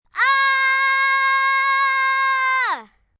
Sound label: Scream Aaah, Scream, 1 child, yell, low-pitched, 'ahhh'!, human voice, kid; Screaming Sound Effects; Sound Effects:Voice; VoiceBox